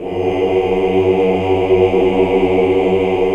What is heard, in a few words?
OOH. OOH UNI; Hallelujah